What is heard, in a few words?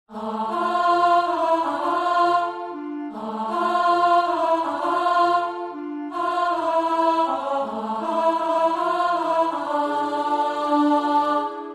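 Soprano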